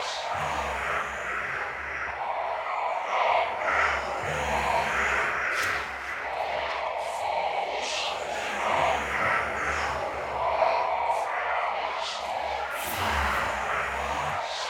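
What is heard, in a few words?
insanity bg loop; smite; god cthulhu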